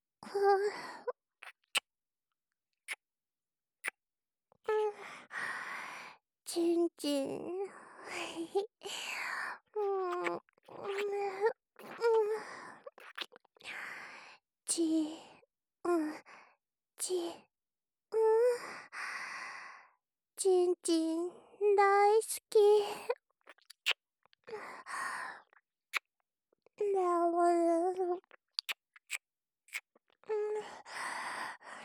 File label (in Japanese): キス